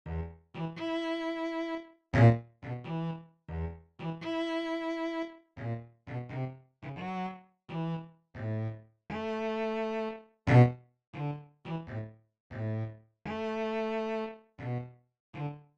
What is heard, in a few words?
Bass; Brahms